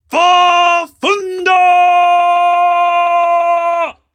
Roar; Dung Def

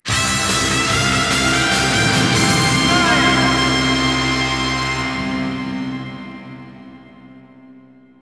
Str G#

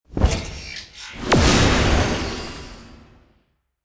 Protection; starbites

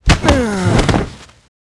ArcSmith dead

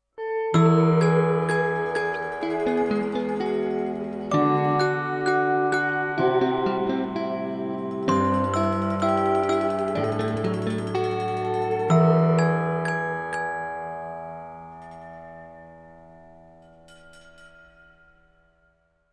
ship arrival; curious expedition 2 macos ps4 switch windows xbox one xbox series xs gamerip 2021

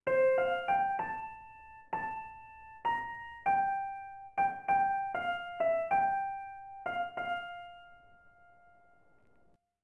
ending piano; Kono Aozora ni Yakusoku wo; Melody of the Sun and Sea; PS2

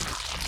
Turret Gushing Loop; Hollow Knight; Mawlek Turret Gushing Loop

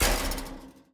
Footstep Metal-Fence

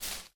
Footstep Foliage Bush Small; Viewfinder

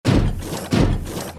Walk; starbites

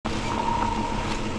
Walk; starbites

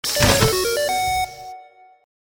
Keypad

